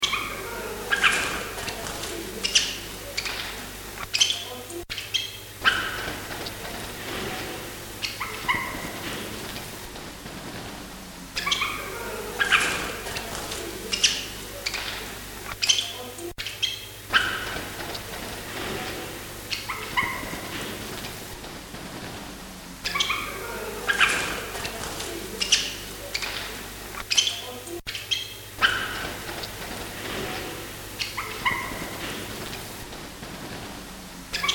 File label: birds loop, mono; open studios 2010